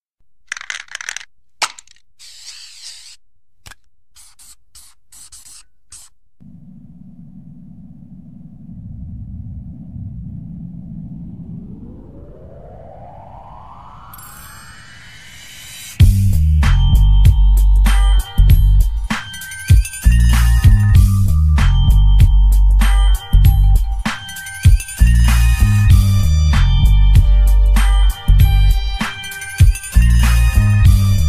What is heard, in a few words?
Bass Deep Bass Boosted; Gta San Andreas